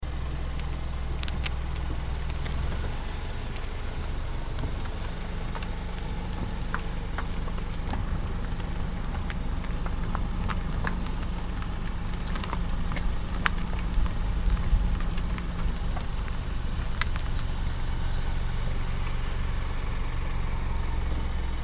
Car Driving Slow

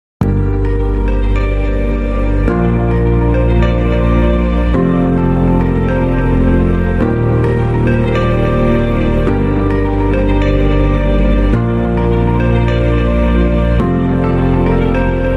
Corporate

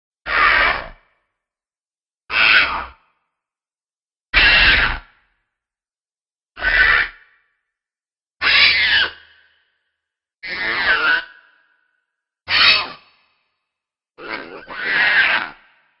Dimorphodon Sounds

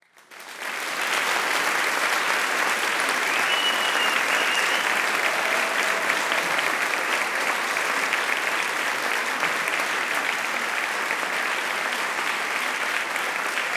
classroom long applause and whistle; mixkit

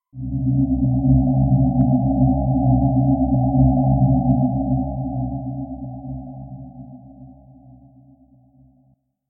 space dark sound; salamisound

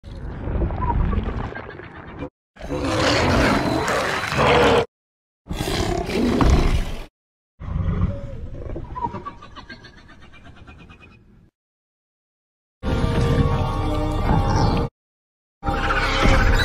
demogorgon sound effects; Stranger things

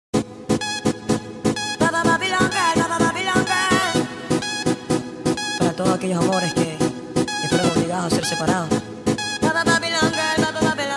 sped up

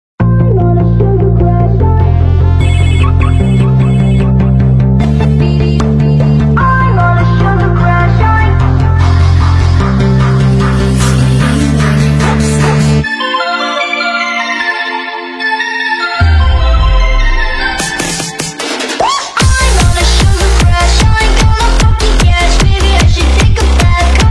dance remix

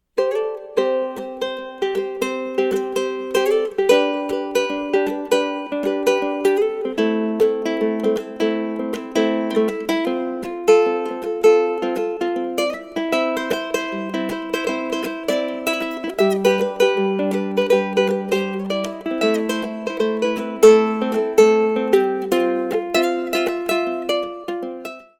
ukelele

